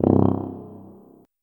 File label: tuba note